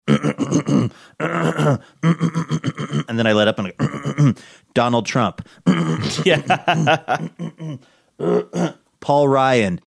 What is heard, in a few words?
making throat clearing noises; saying donald trump paul ryan